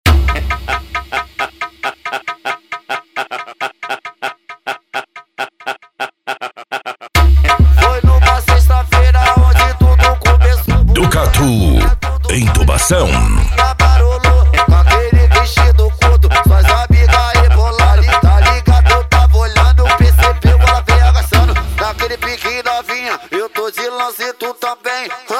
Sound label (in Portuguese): funk agressivo